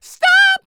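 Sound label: falsetto, R&B Dance; Spectrasonics Vocal Planet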